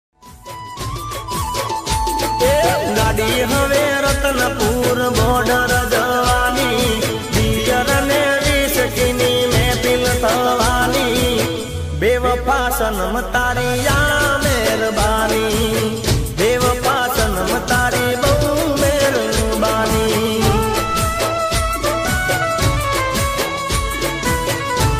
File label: gujarati heart touch